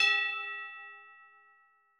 E6 bell

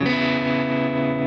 Arpeggio down

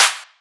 Clap

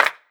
Clap; osu

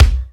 drum hit normal

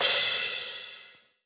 soft hit finish

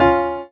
Drop Lead; osu

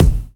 kick spinning

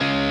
C#m

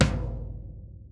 Drum Tom

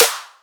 soft hit clap

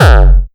Kick 3 G#; Tritone

